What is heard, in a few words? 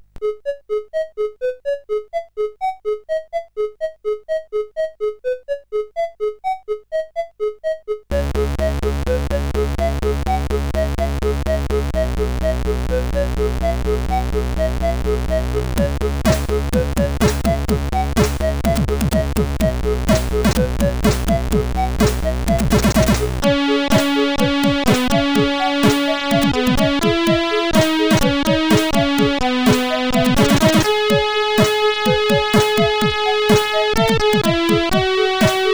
CSG8580R5; sid